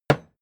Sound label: hammer wood hard